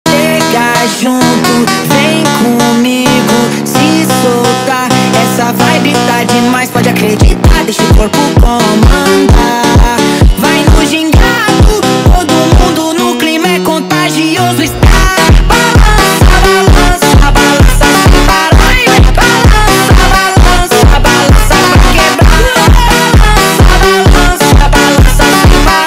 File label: Phonk